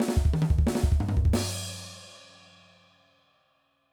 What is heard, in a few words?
Punk Fill, drum